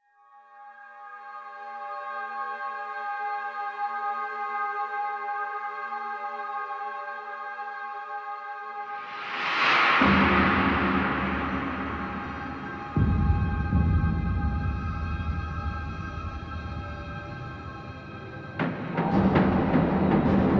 Amb Cue, Music; Warframe; DE; Hubs; Syndicate Red Veil; Tenno

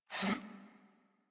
Pet Alert Snort; Enemies; Warframe; Catbrow; Pet Ship

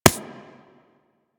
Laser Impact Generic; Surface

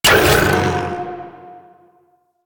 Sniper Mech, Weapons; DE; Warframe; Tenno; Quad Sniper

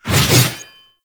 Heavy Scythe Charge Whoosh; Melee; Duviri

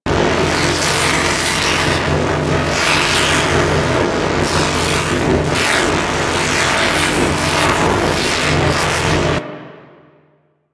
Tractor Beam Electrical Generator Loop A; Crp Space Bridge Transition

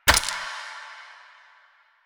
Dice Passive Slot Switch; Warframe; Koumei

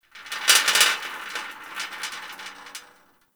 Gas City Rattle Metal; Ambience; Warframe; Corpus Gas City

